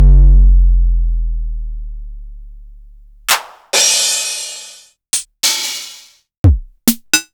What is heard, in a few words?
Korg Electribe ER-1 Kit